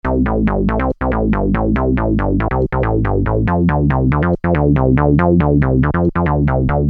Syn-Bass m C, Techno Synth Loops, 140bpm; Sonic Foundry (Sony Creative Software); Signals I; SynBs

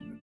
VIBRA; Spectrasonics; Metamorphosis

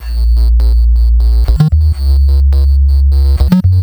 Subb Glitches, Bass; Sonic Foundry (Sony Creative Software); Underground UK House & Electro

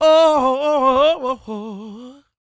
CROON, Vocal Planet Blues & Soul; CW SOUL

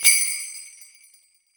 SLEIGHBEL; BELLS; Voice Crystal - Steve Reid Definitive Percussion

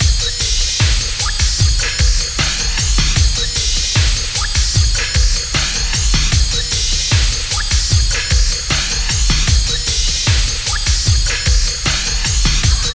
LOOP, Tekno Industrial; East West

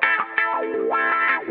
GUITAR

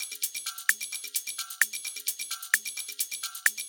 Live Percussion, 130 BPM; Sonic Foundry (Sony Creative Software); Underground UK House & Electro

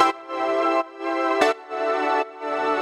Pad DS201 170 E, sidechained

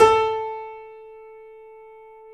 Small Pianos, 3-D Audio Collection; E-MU Producer Series Vol. 5; 3D Multis

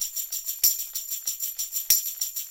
Percussionworks; Sampleheads; NYC Percussionworks; 90 s Sample CDs; LOOPS 96

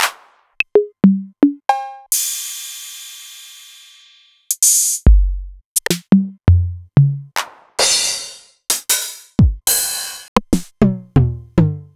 Novation Drumstation; DrumstationV1

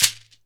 SHEKERE, Synclavier Percussion; ILIO

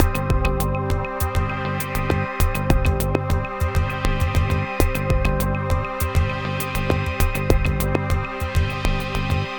Elektro, Processed Percussion

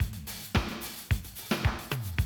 drum loop, 100 BPM

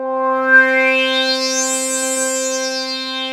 RETRO SWEEP, OLD SYNTHS; Rubber Chicken Software Sample Bank

